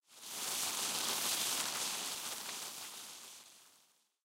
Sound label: flock large oneshot